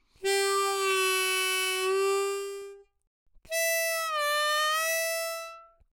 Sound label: Free Reed; 気鳴楽器; Harmonica